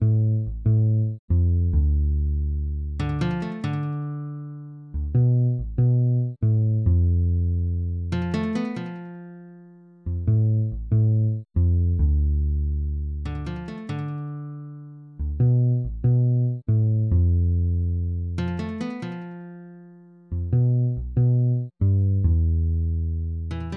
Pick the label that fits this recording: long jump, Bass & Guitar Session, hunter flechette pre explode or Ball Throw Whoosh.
Bass & Guitar Session